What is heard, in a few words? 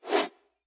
cut